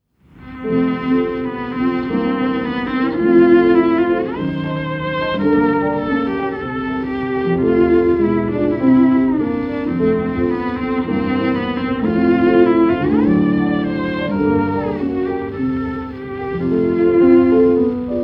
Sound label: Melody in F, Cello; Cello Encores; Rubenstein arr Popper